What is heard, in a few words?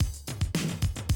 drum loop, 100 BPM